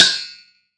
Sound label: Metal Hit; ModeAudio Found Percussion Hits